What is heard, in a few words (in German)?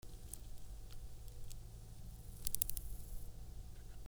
Acrida ungarica Abflugschnarren, Ungarn